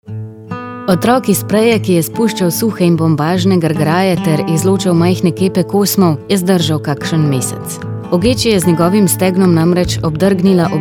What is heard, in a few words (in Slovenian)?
jingle; TUPATAM NOV